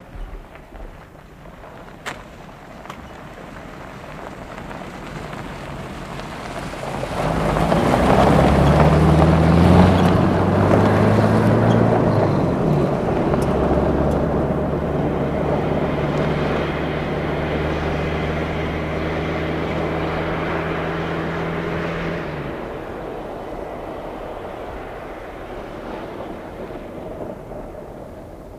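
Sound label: Buick Coupe By On Dirt, Long Out, antiques; 1934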